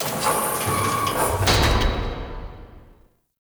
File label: Piston Machine; Dante Unbound; Warframe; Ambience; Entrati